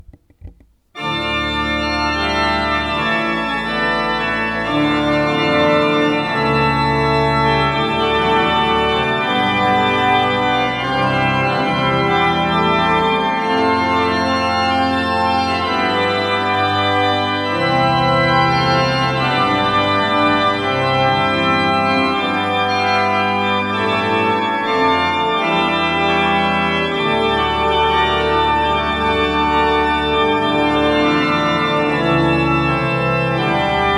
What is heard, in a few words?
Plein Jeu a 5; de Grigny